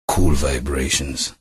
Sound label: Cool Vibrations